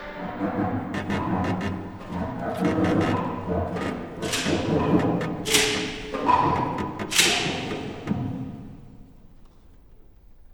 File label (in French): bruits